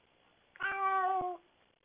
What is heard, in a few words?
cat meow